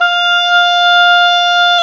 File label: OBOE, mf f; Roland L-CDX-03 Disk 1; Oboe 2&3